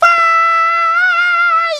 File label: lunge scream loop; huggy wuggy snpc; elmo